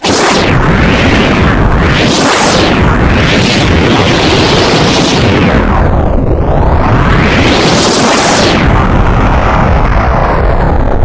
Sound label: sound rocket; cstrike; ZP CRISTIAN